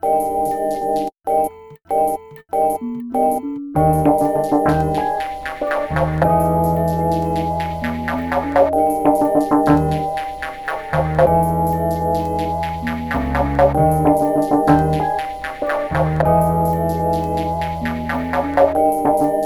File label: Melody, Hip-Hop